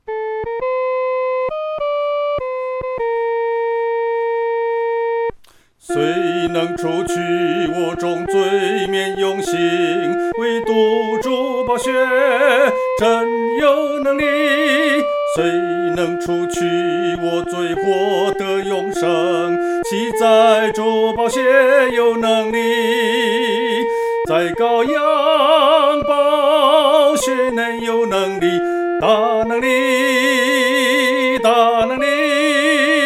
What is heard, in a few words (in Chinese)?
独唱 第一声; 宝血能力